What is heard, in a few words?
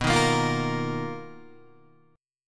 B-Major Sharp 11th B down Guitar; strummed; 4F,4A,5C,5G,5C,6E; JGuitar